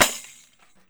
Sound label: PANDEIRO, Brazil Drum Perc; E-MU Producer Series Vol. 7 – Old World Instruments